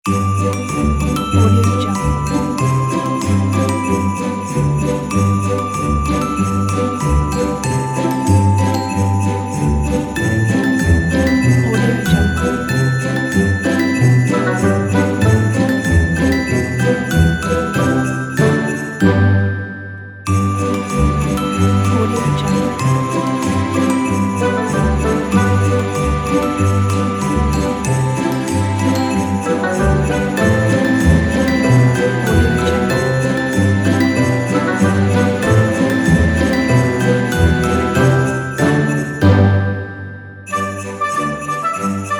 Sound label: christmas holiday; z-studio